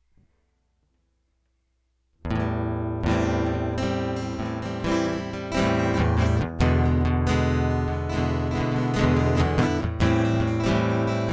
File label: By Christmas Eve Instrumental; AD BC